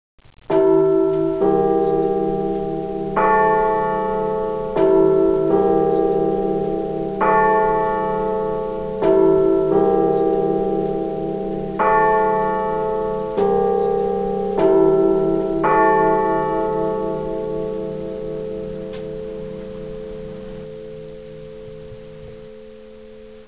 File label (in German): glocken gelaeut wildemann reihenfolge